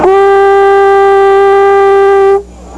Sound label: conch; wedding